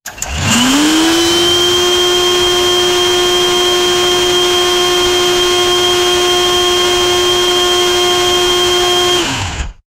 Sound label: 6 cilinder engine; automotive